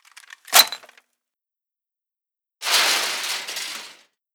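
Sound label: metal debris movement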